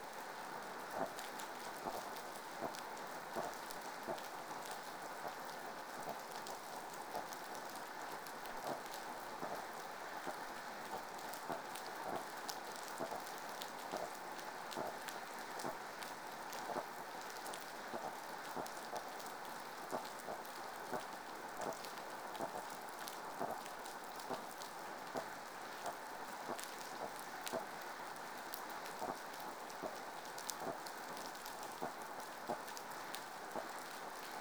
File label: Sciaenidae